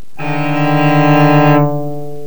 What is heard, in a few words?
cello